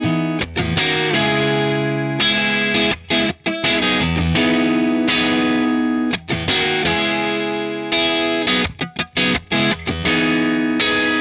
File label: Elec Gtr